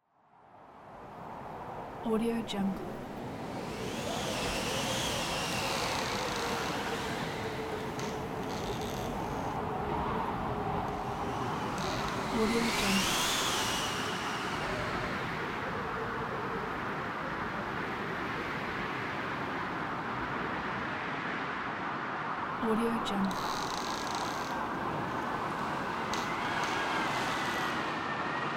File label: wind winter forest